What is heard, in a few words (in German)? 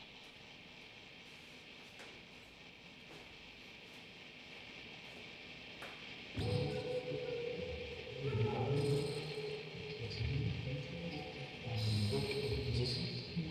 Aufnahme Rode NT4, Spieluhren-Quartett mit Tonband, Vocoder und Fieldrecording